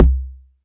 FM Perc C2

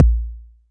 BD; TRX

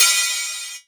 Crash Cymbal

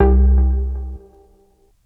Polaris Space Delay Vinyl Synths G1; vinyl keys

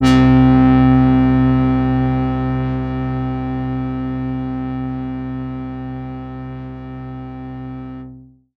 BASS Neat bass

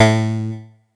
atari 520st A2

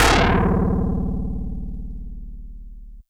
Harpsi FM DX100 D0

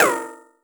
Metalica

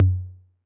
Tom Low 808 Sat, Saturated

